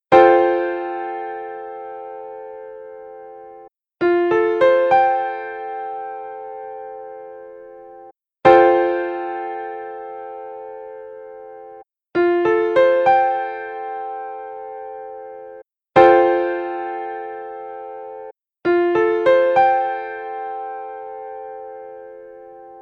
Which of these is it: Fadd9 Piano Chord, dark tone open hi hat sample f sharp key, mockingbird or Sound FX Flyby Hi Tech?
Fadd9 Piano Chord